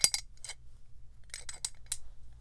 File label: Metal Hinge, toolbox rummaging